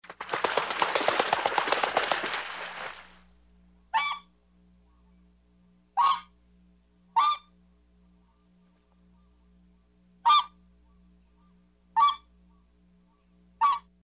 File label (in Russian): lebed krichit hlopaya krilyami zvuk